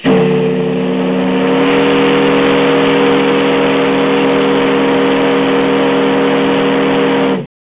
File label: horn